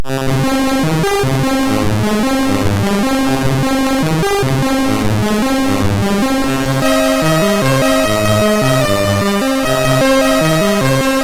sid, MOS6581R3; Sweet Dream

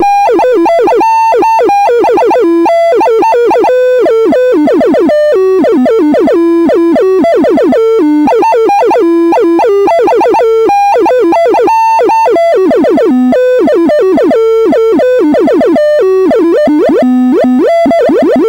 Blues, MOS6581R4; 128 Byte Blues BASIC; hvsc

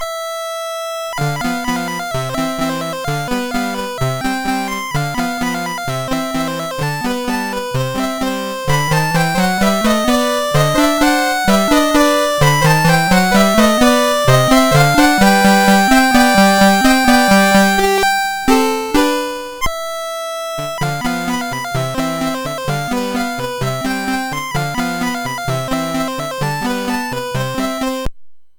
Pappys Polka MOS6581

